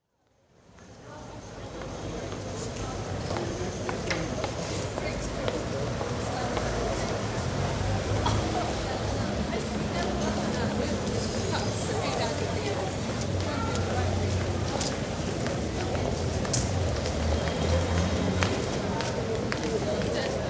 Soho atmosphere, mainly pedestrian street with international voices; Crowd; BBC